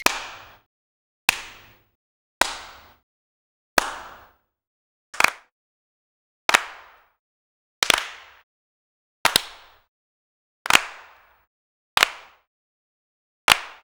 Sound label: single claps mix; Cartoon - film effects